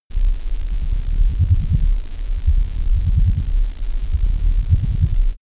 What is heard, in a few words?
space alien machinery steady calm pumping